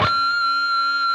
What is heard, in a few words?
PRS D 4, PINCH, Guitars; Spectrasonics; Hans Zimmer Guitars VOL-1